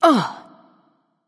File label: ouch; smite; freya